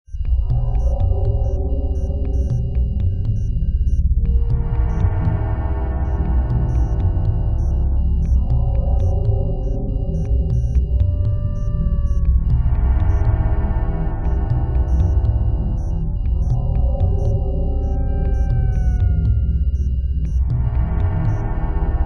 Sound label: Music Bed Eerie Ambi